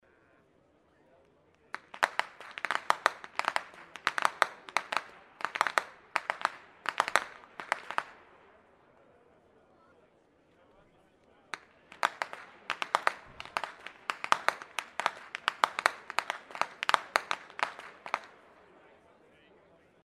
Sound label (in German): schnalzen, jugend; kirchanschoering